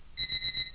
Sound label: alarm